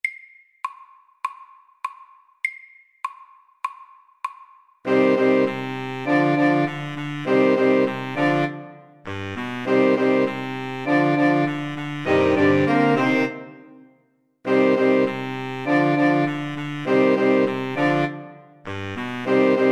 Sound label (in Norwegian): Jam SAKTE